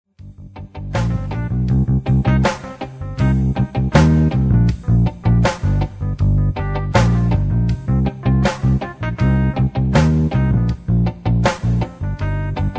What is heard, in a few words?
dark rock